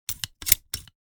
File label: Silicone sealant gun single application; hand tool